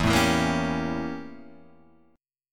A 7th Flat 9th E

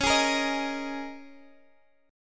Ab Suspended 2nd Suspended 4th chord

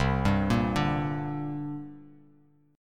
C 7th Suspended 2nd, C7sus2 chord; arpeggiated; 8,10,8,7

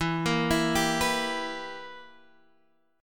B Major 7th Suspended 4th Sharp 5th E

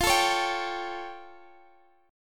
A 7th F, chord; A7/F; strummed

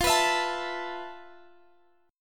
F Major 7th Flat 5th, Guitar, Standard; strummed; capo 2; x,20,21,21,22,x

